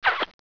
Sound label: KISS